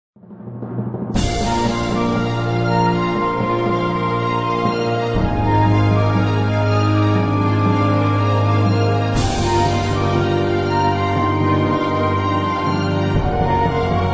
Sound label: Loading Screen; Civilization Revolution; xbox